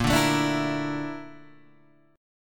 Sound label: A sharp Major 7th Flat 5th, Guitar, DADGAD